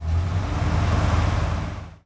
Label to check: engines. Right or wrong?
Right